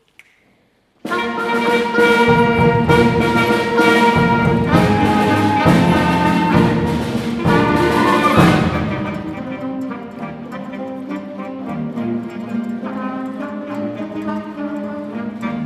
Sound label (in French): Orchestre